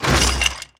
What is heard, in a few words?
Dice Drop Item